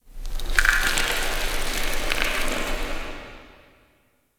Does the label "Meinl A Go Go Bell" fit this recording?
No